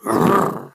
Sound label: Scream